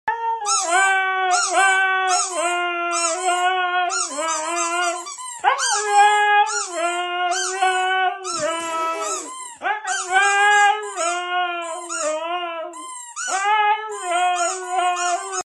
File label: Husky talking; meme sound effect